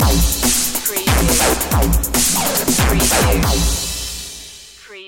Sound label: distorta sting